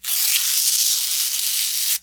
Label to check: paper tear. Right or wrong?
Right